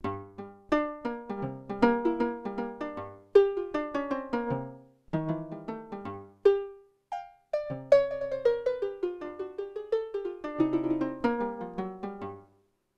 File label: Normal Mute, Harp, Yamaha TX16W